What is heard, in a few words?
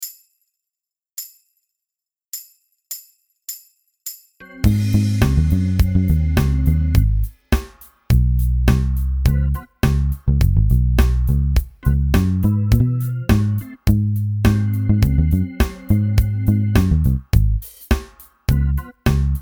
R&B, bass